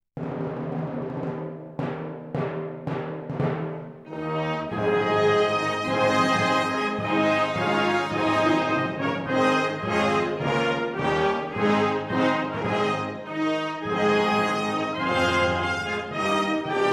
Regimental Slow March, Massed Bands of the British Army; Blues and Royals